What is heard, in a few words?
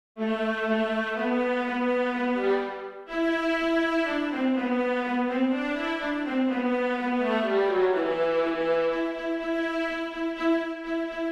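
Bartok For Children Canon Viola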